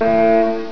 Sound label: buzzin